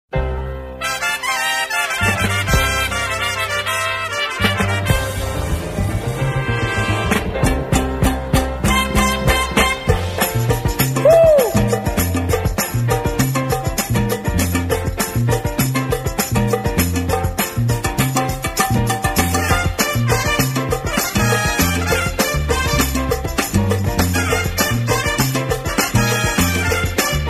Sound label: mambo rock